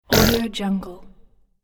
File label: cartoon splatter mess